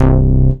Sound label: Ensoniq VFX-SD Dance Bass C1